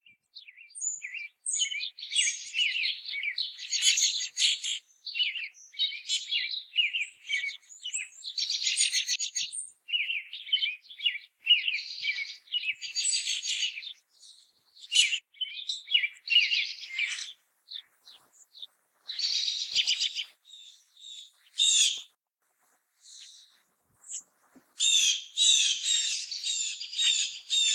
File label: birds; Cubed; ambient